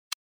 toggle off, A toggle switch with distinct on/off sounds.